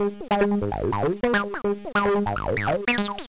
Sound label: nice loops distorted; 303tracker